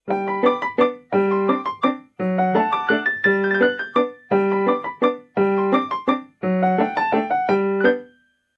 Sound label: Waltz, ringtones